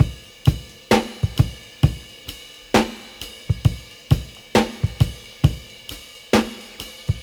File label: 66 bpm drum groove e key